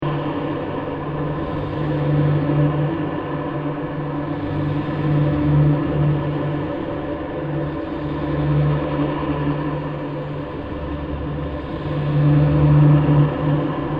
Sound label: Scary Generator Ambience 120bpm; Scary Soundscapes; Horror; Crafted Signals